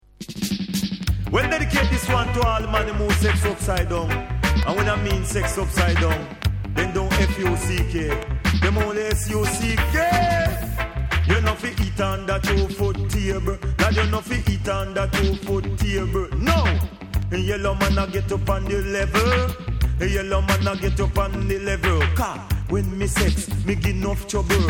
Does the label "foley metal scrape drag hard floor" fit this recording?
No